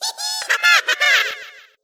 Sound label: giggle; kzdokuroku